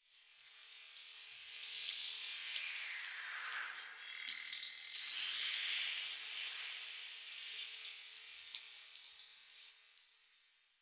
Data Texture; Warframe; Venus Open World; Venus Solaris United

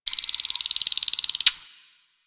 Forest Bird Rattle; Ambience; Warframe; Grineer Forest

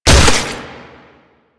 Proto Infested Ancient Step; Warframe

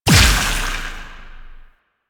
Impact Large; Weapons; Warframe; Duviri; Incarnon Adapters

